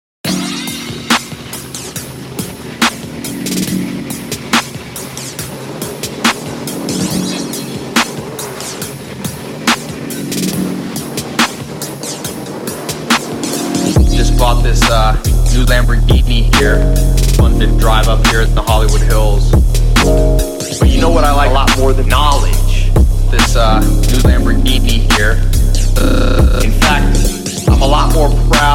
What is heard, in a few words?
Lofi Hip Hop